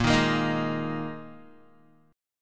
A sharp 7th Suspended 4th down Guitar 4E 4A 5D 5G 5C 6F; A#7sus4; strummed